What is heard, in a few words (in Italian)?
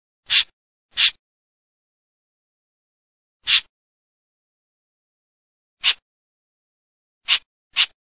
GHIANDAIA, birdsound